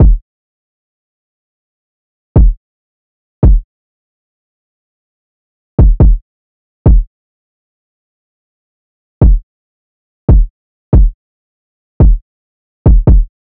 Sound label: Drum Loop - 140 BPM Kick, Drill; Loop Stems; Cymatics; PARADOX II - Drum Loop Collection; Bow